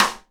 SIDE STICK, Live Snares

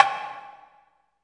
Dumbek; Roland LCDP14 Africa VOL-2; Af.Hand Drm2; N.Afr.Dumbek

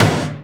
TOM, Drum Dry; East Collexion; Drum 1

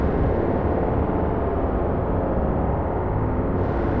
sparse soundscape samples; ReLooped

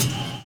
live kick; Univers Sons - Kitch 70s

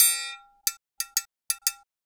Cajun Triangle; Sonic Foundry (Sony Creative Software); Joe Vitale Latin Percussion

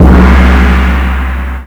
crash, trash kit, Drums Dance & Groove; USB Soundscan vol.11; AKAI

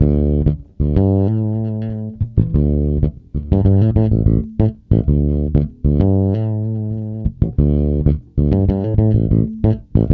D, funk, bass; Spectrasonics; Bass Legends

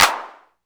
CLP, Korg EMX-1, Drum Machines

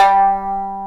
MANDOLIN G 2; AKAI S-Series CD-ROM Sound Library VOL-7

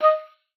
OBOE D#5; Xsample VOL-6

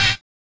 collision; Spectrasonics; Metamorphosis

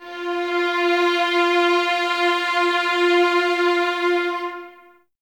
F 4, 11 Violins, Soft; Miroslav Vitous

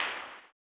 CLAP, Blues Soul; Spectrasonics Vocal Planet

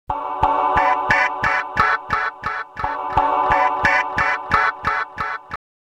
Nice Strings; AMG - Prototype Drum & Bass

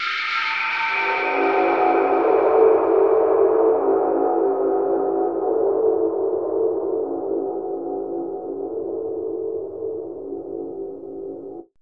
MYSTERY, AMBIENT; AMG - Vince Clarke Lucky Bastard

